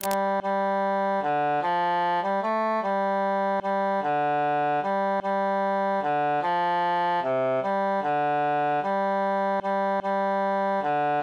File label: three country dances bassus solo